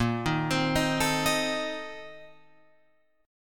Bb Major 7th Suspended 2nd Suspended 4th, Guitar Open F, chord; BbM7sus2sus4 arpeggiated; JGuitar